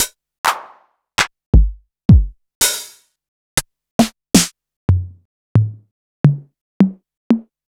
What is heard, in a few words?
Electro, Kit; Sliced